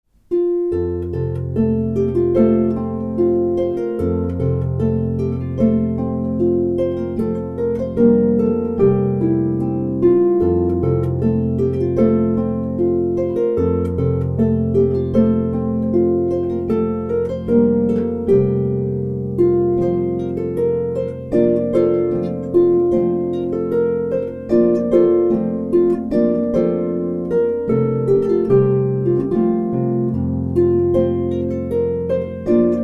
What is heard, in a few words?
Spring